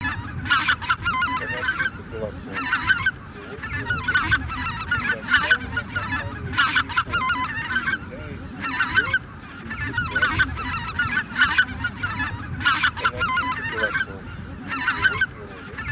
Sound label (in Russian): Белолобый базар Oche Lombardelle